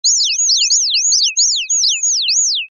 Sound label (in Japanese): hiyoko